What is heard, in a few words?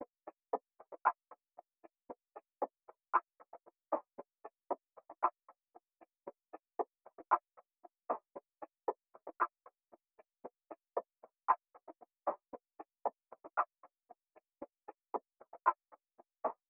Drum Loop, 115 BPM Percussion; Loop Stems; Cymatics; Vibes II Drum Loop Collection; Round Two